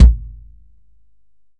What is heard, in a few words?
Kick, EMU PX-7 Drum Machines